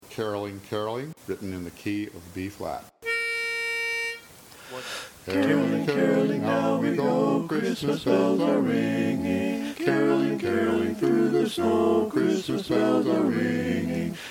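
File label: Baritone, Learning Tracks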